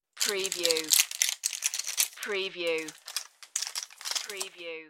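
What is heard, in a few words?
Plastic Packaging Crinkle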